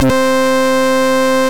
Bass, Synth Lead; Quintmania; Ableton; Creative Extensions

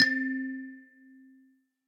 Tiny Thumb Piano; Instruments; Ableton; Factory Packs; Lost and Found; Lost Keys

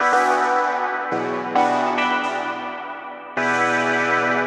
Nostalgic Chords - Emin 107 bpm; MIDI Clips; Tonal; Motifs; Ableton; Mood Reel

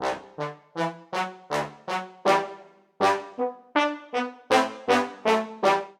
Ostinato Optimistic G Major 80 bpm, Brass Quartet; MIDI Clips; Ableton; Spitfire Audio

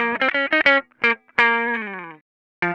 087 W-Coast HipHop Guitar Bbm

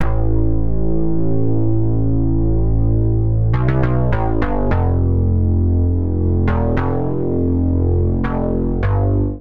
Bass A Minor 102 bpm; Bassline; Tonal; MIDI Clips; Past; Drive and Glow; Ableton; Factory Packs

